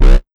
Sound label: ROCK 132 FX, Big Beat Drumloops